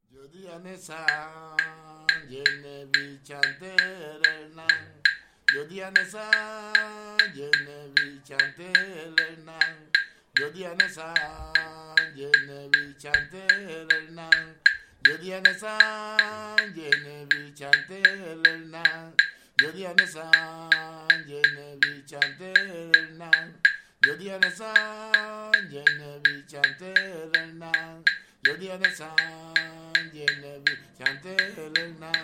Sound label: Merengue